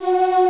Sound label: Choir Aahs F#4; Hexen Music; Hexen 6vox